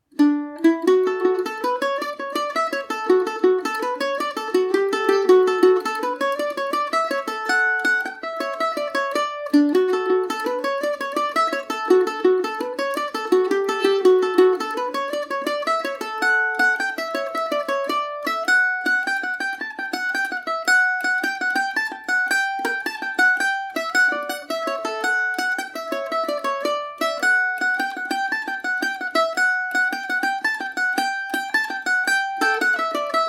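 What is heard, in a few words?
humours of drinagh d major, mandolin; Paris Swing Macaferri mandolin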